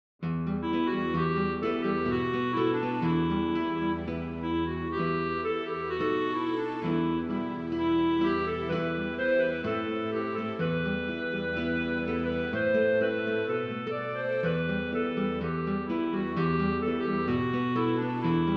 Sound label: instrumental; New Hope Music